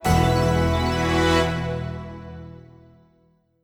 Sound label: General Success; Xenoblade Chronicles 3